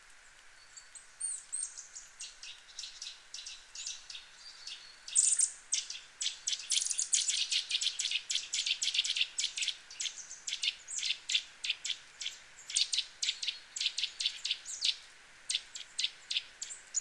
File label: Sitta europaea; SETE